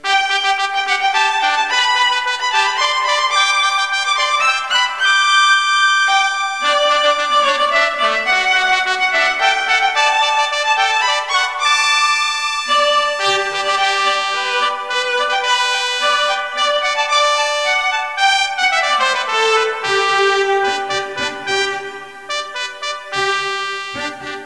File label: music; classicl